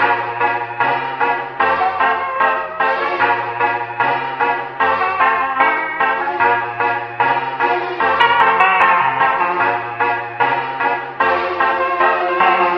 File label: keys